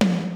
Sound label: HIP HOP, ENSONIQ TS-10; Rubber Chicken Software Sample Bank